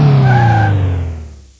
train brake

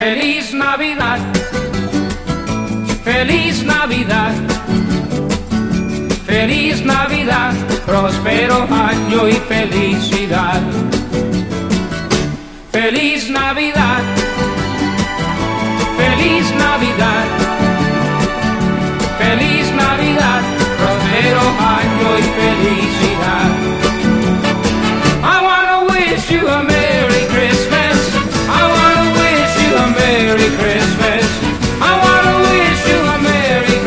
disco

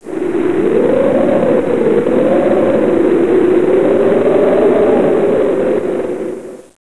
tk wind street; de torn

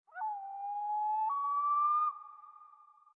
forest owl; docks

